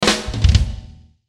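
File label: Musical Element, Drum Snare March; Blastwave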